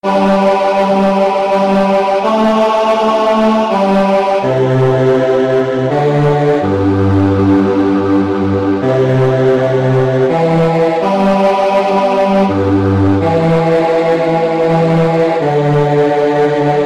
Faith Of Our Fathers Bass; choir

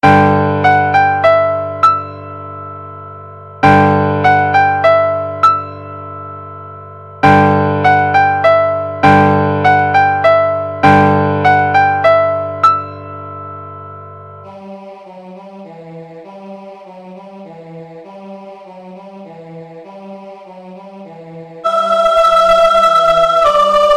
Carol Of The Bells, choir

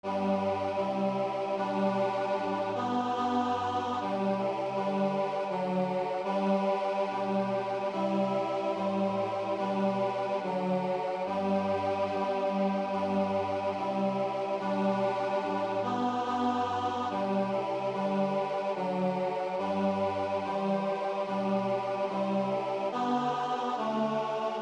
Tenor, choir; God of Tempest God of Whirlwind

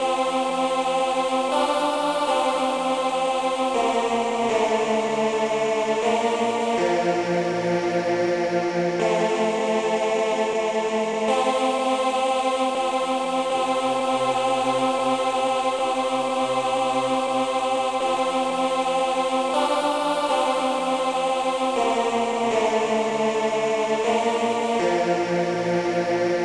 Jesus Keep Me Near the Cross Tenor, choir

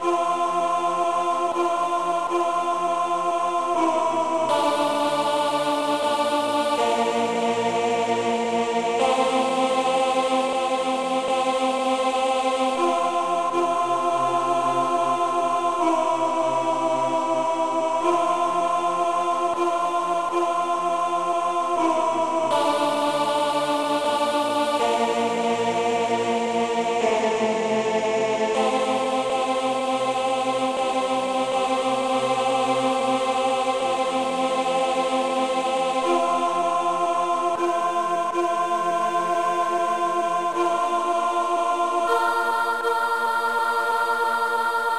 Jesus Keep Me Near the Cross Alto, choir

